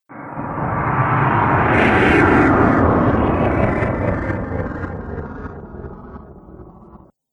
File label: Alien Ambience Motion; Alien Sound Effects